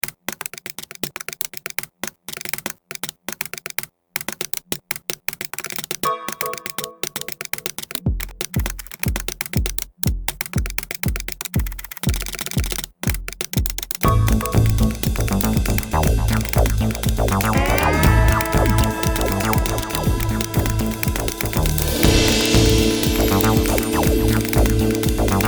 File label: Orchestral